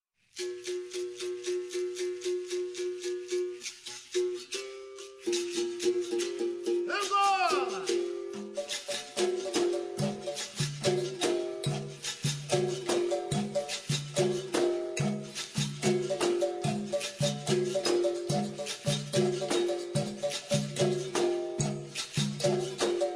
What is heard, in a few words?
capoeira